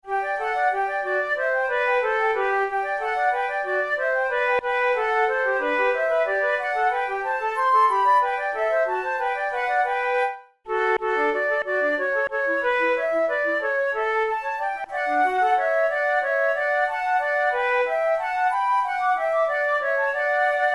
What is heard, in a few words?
horn i've been roaming duet, flute